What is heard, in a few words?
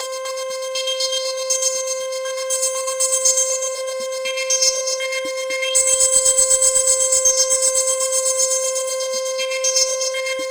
Roland JV 2080, poly saw